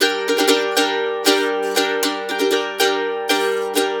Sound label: RIFF, Blues Guitar Samples; ILIO; Fretworks; CEE RIFF